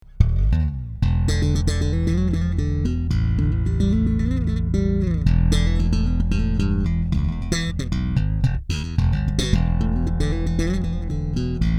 v7 5 active slap; marcus miller

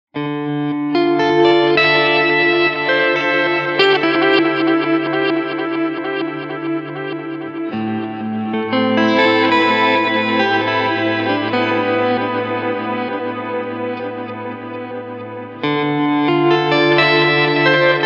Reverse

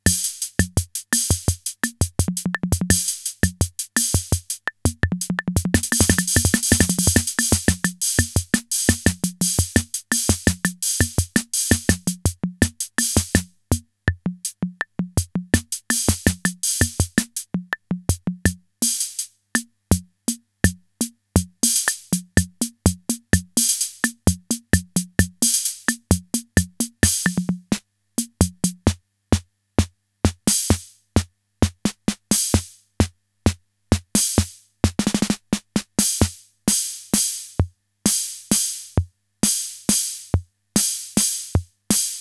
Casio MT 400V drum rhythm 7

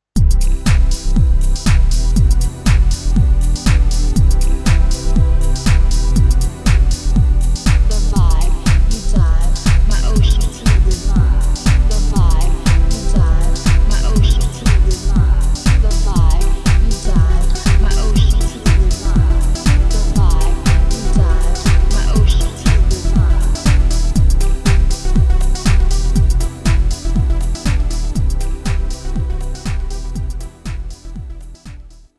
Electribe SX House

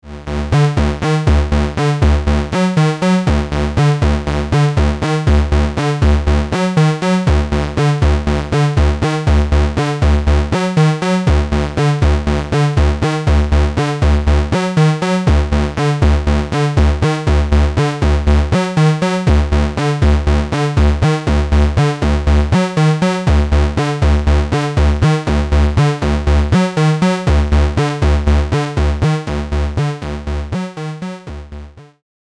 Instrument In Pre Amp Solid State

